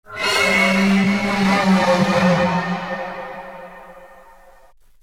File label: Monster; Cloverfield